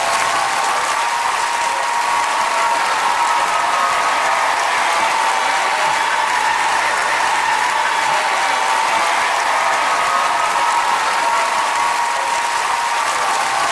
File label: congrats cheers